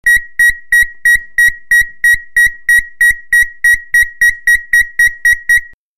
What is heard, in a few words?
Alarm Rapid Single; hi-tech-various